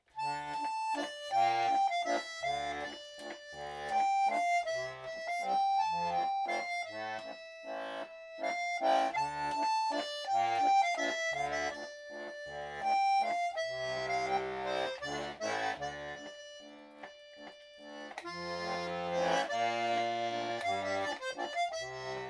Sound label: BRAND valse